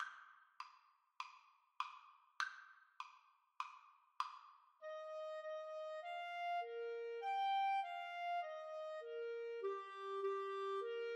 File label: ode to joy, Flute Clarinet duet; beethoven